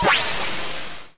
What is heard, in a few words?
Small Cast